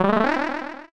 Magic Blast; NSMBW

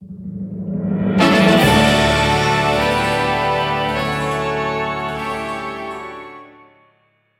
Museum Fanfare

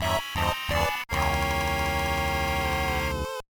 You Lose; MPA

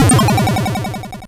Sound effect of Power-Down; SML

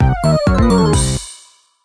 Losing a level; Air Tumble Rumble

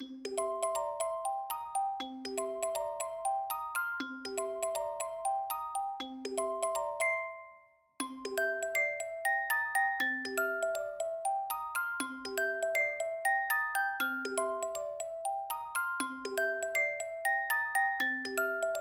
Forest Night; SMM2; NSMBU

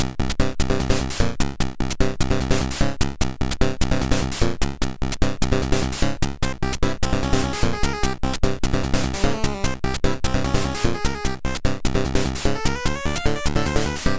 Boss Battle Theme

